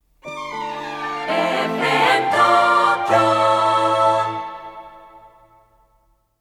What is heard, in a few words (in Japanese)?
FM東京 サウンドロゴ